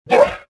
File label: choke